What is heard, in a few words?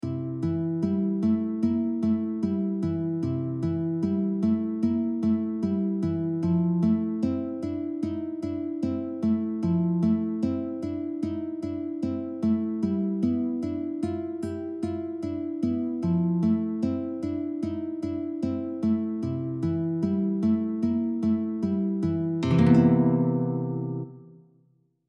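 rock-n-roll, guitar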